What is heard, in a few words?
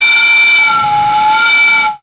sound design effect air pipe pressure